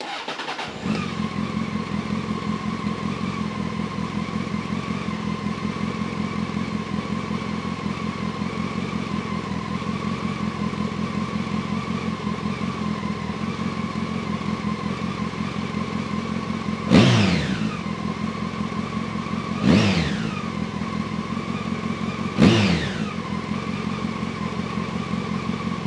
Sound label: Stock Exhaust, CB900F; Bafflectomy